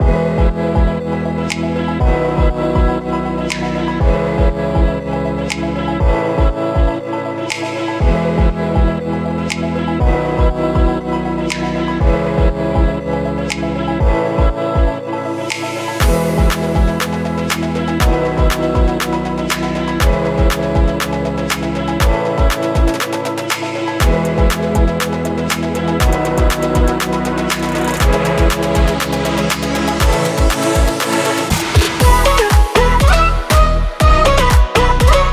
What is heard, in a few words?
EDM Pop